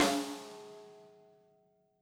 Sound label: TUBE; STA DOBLE